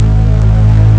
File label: Strings, Amiga Soundtracker; Amiga Soundtracker Sample Packs